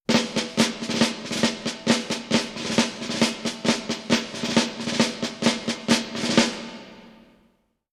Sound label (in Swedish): Tropp trumma